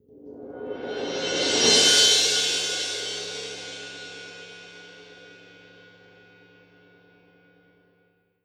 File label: cymbal roll a f; Percussion; Sonatina Symphonic Orchestra